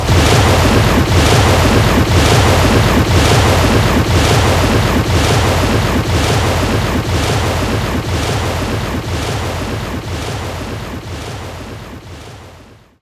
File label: Sea Uzu; Etrian Odyssey III HD